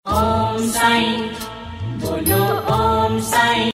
om sai ringtone; saibaba

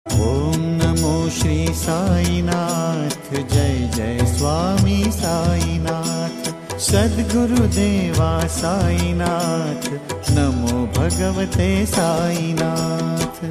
sai mantra ringtone; saibaba

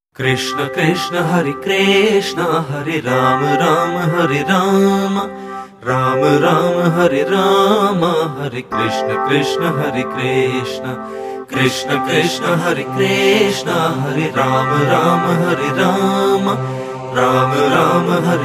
hare krishna capella, krishna ringtone

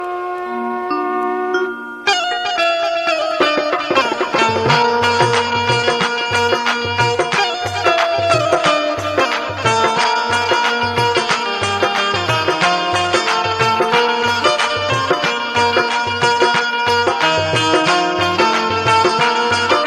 Khatu Shyam Aarti Music

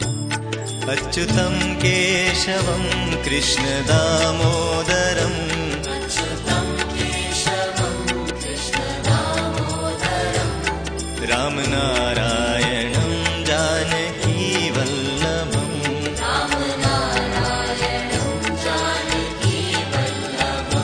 krishna bhajan, ringtone

